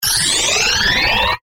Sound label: Ascend Digital, Production, Element, Ascend, Digital, Flutter; Ascending Sound Effects; BlastWave